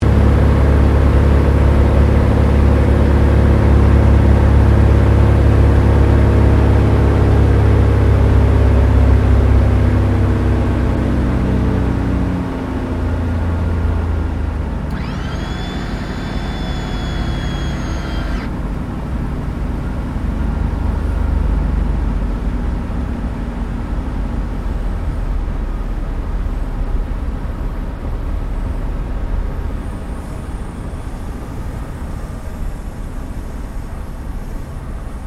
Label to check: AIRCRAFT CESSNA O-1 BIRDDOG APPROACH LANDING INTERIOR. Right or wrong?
Right